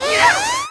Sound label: Balloons; Balloons appearing; Tingle; Zelda